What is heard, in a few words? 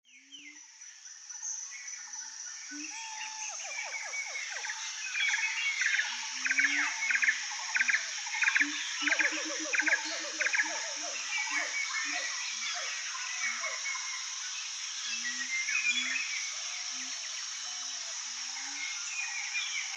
Junglebox Sound; tropic; relaxound